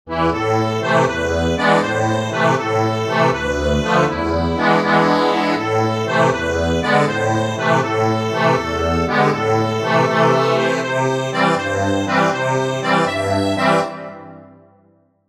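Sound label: accordion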